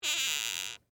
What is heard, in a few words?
Door Squeak Wood, Foley, Door, Squeak, Wood; BlastWave